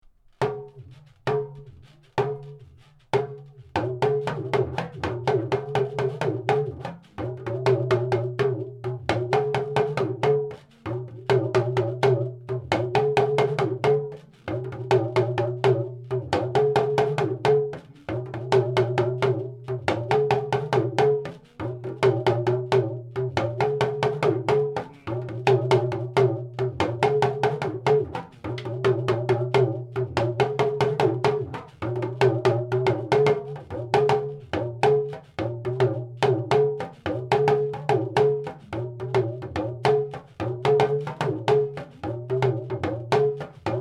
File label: Lead Lunga Drumming, dagomba